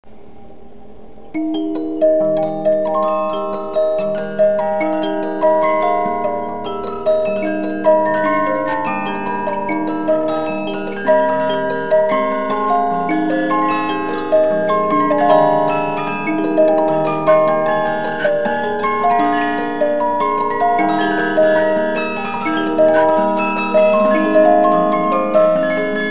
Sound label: musicbox